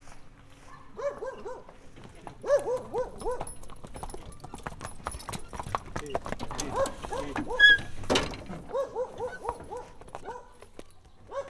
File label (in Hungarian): lovaskocsi megerkezese; állathangok; paraszt udvar